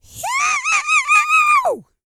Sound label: YELL, MALE HUMAN; Spectrasonics Vocal Planet